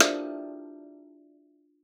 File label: SD FX; Xsample VOL-4